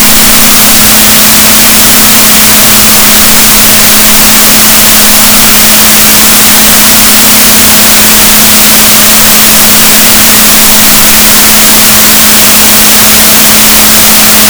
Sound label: rorquals negatif, gaussian noise, Balaenoptera Physalus Chile